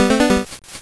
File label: broom